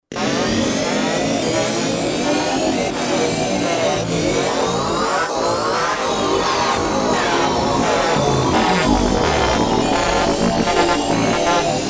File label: Huge Machine